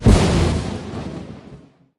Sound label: lightning strike